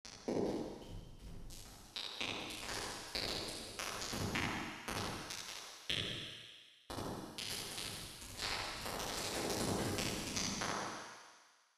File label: Large room bright, reverbs; tcworksnative2